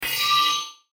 MOUSE ROLLOVER HIGH TECH, Mouseover, Swell, Synth, Reverb